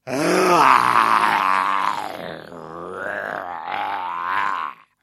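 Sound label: sounds of voice of caveman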